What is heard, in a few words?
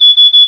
weewee